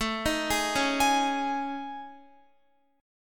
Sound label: A Major 7th Flat 5th, Guitar; AM7b5; arpeggiated; x,12,13,13,13,15